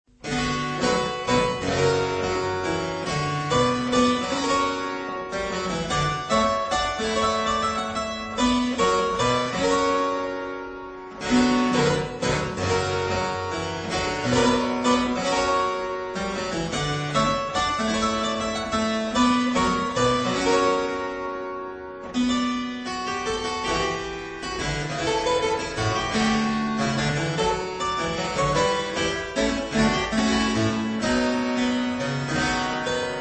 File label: Suite in C Minor, Menuet; Suite no 5; J Mattheson